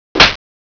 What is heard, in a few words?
slap